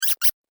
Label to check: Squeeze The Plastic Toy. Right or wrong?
Right